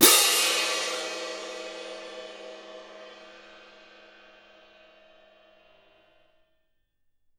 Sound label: CYM F -R, Percussion; Miroslav Vitous - Percussion & Harp